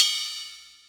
Ride, Ensoniq ASR-X

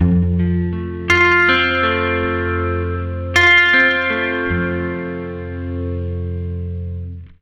80 MAJ ARP F, Acoustic Guitar Loops; USB Soundscan vol.04 - Electric & Acoustic Guitar Loops; AKAI

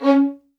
MED ATT C# 3, soft violins; Q Up Arts; Denny Jaeger Master Violin Library

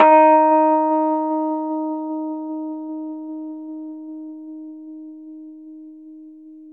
Rhodes 73 Slow; E-MU Producer Series Vol. 5 – 3-D Audio Collection; 3DRhodes

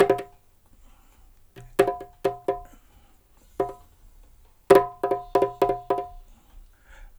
135 BPM, perc loops, dub; Drumdrops In Dub VOL-1; 130-140 BPM

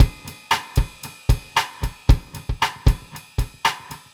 Sloed gruve, Synchro Funk, Beats; Sonic Foundry (Sony Creative Software)

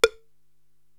Vocal Cowbell; Korg TR-Rack

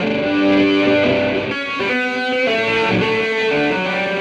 Electric Guitar

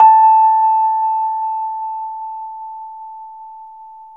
Rhodes 73 Slow

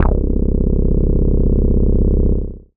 bass C, Sub Bass, Dance Synths; Samples vol.37 - Dance Synths

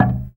GAMBANG A#2; Spectrasonics; Heart of Asia

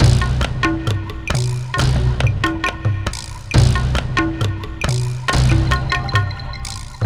Percussion Combos

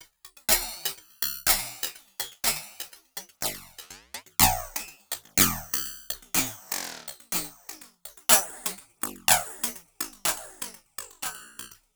Drum Fx Loop, Electronica; Drums & Percussion; FX Kits; Sonic Foundry (Sony Creative Software) - Electronic Point Blank

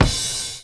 Kick Crash; Sonic Foundry (Sony Creative Software); Stylus Pressure Urban Grooves on Digital Wax; Lenny Hendrix

